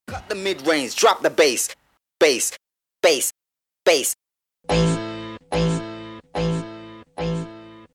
Vocals ('bass')

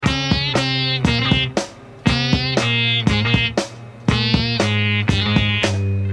Sitar & guitar & drums sequence

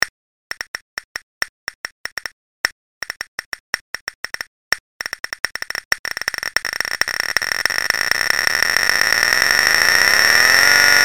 harmonic series - major chord

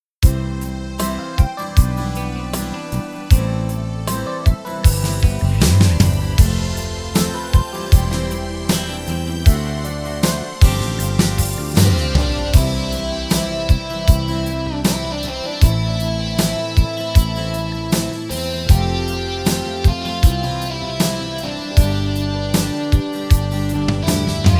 SOLINA

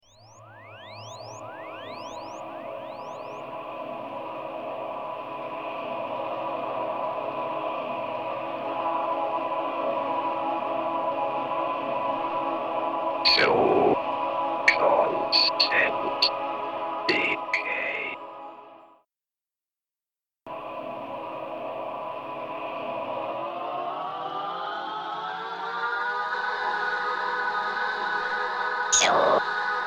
Pad

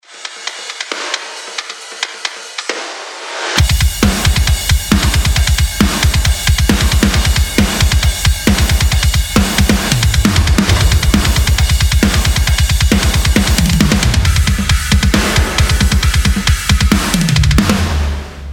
drum track

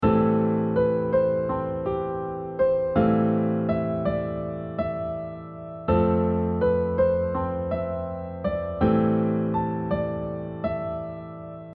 modal - quartal triads; modal harmony

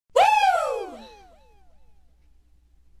Shout ('wooo!')

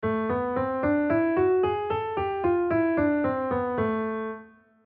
melodic ascending and descending

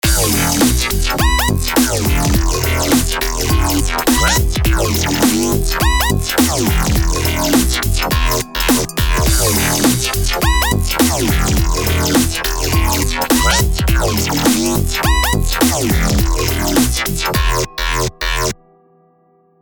liquid glitch hop

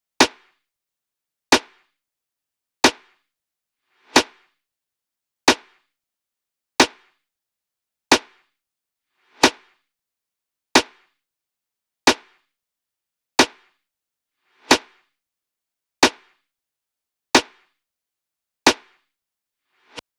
Snare 91bpm; Wealthy

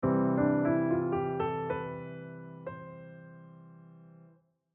leading tone pull

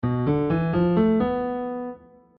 synthetic scale (half-dim chord)

